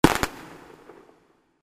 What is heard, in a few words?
explosion; Kung Pow